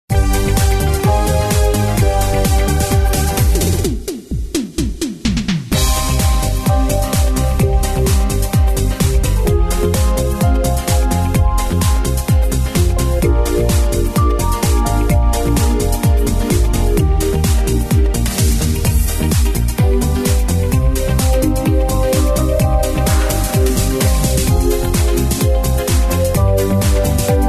the blue oyster patter rhythm track, square dance music